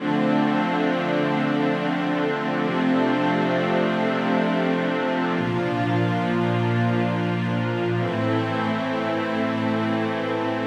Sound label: Strings Harmony 90bpm D minor; hh strings